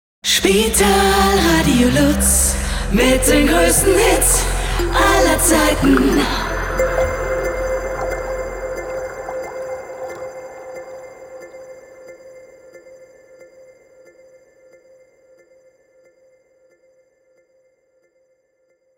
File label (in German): 90 BPM JINGLE BUBBLE LIGHT; Spitalradio LuZ